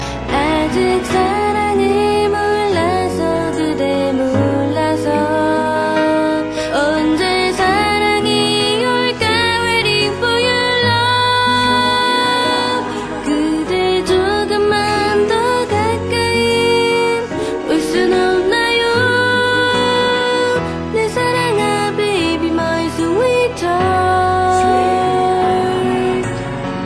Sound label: witch